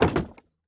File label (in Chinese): 竹木门开关声